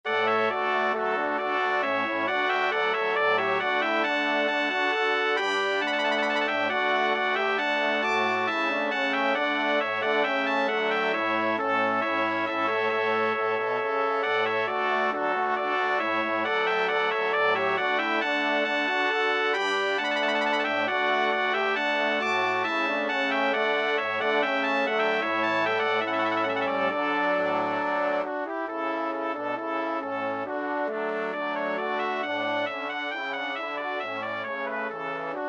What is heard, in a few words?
Brass Quintet